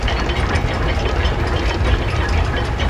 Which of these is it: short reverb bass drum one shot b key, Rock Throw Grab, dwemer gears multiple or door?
dwemer gears multiple